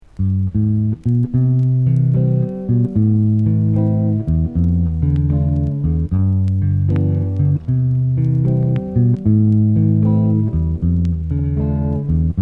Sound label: orchestra